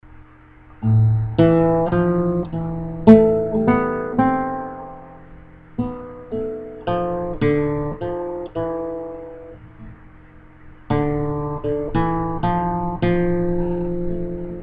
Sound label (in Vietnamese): Lễ Dâng Phục Sinh, Sop